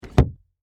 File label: Door Latch Open, Foley, Wood, Door, Latch, Open; Latches (Sound Effects:Objects); SoundEffects-BlastWave